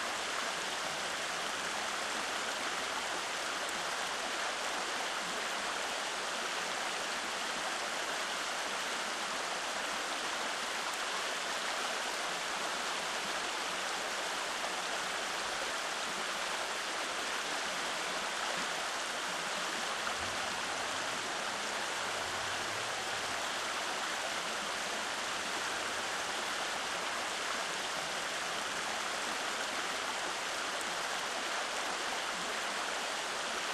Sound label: stream; Titanfall 2